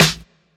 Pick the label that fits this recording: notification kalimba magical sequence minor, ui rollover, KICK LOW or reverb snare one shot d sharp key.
reverb snare one shot d sharp key